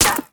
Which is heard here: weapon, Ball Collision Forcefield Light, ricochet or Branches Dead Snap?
ricochet